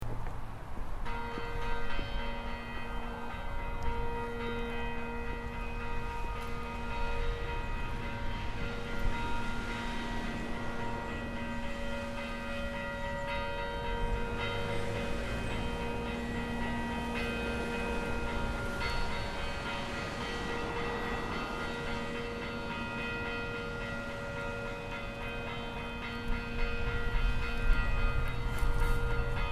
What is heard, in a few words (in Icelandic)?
glerarkirkja brudkaup, kirkjuklukkur; Brúðkaupshringing; Glerárkirkja var vígð þann 6. desember 1992